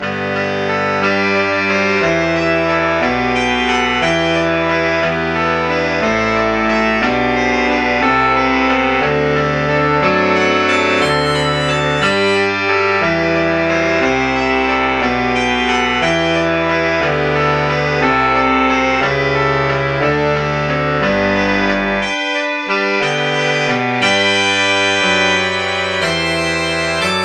tenor sax

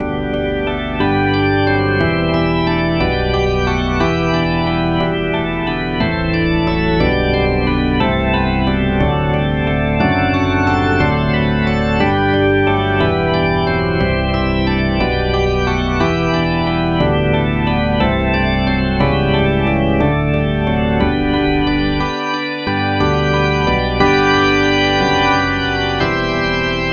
rock organ

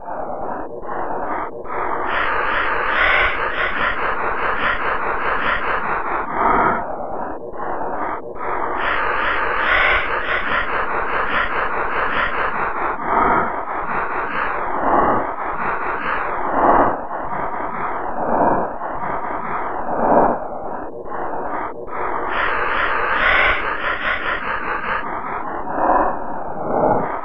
breath noise

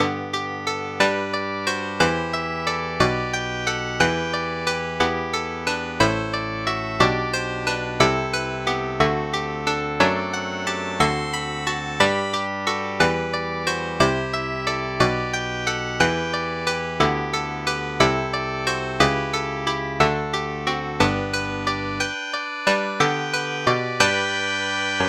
koto

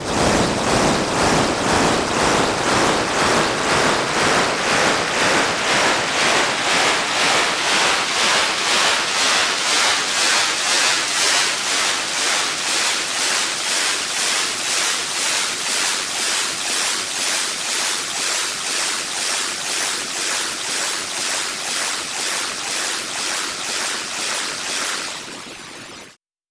sea shore; tonleiter

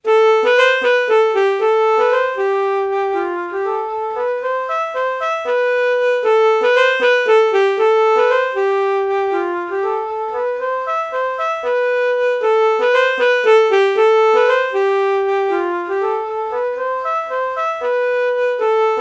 alto flute alto saxophone melody morph

